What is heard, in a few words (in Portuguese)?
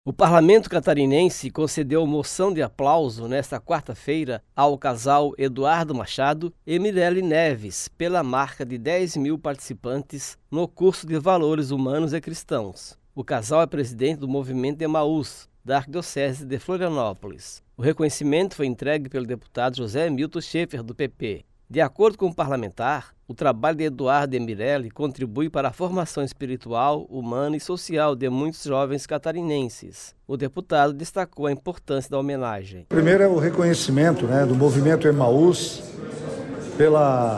aplauso